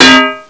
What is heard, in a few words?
ding